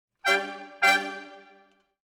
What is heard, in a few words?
Brass, Jazz for piano